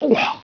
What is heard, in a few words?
SPIT UP, dogz